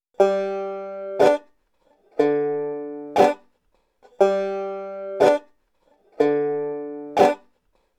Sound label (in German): gedaempfte abschlaege und wechselbass